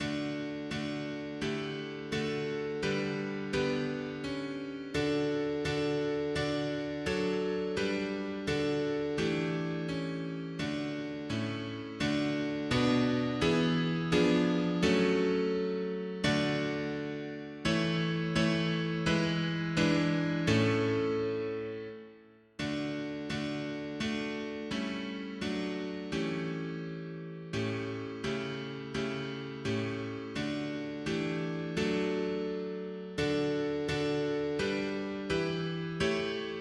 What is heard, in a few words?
mono piano; hymns; Himnario grande